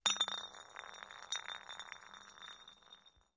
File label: Glass Drops Rolls; bottles